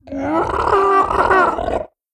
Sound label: Pteranodon sound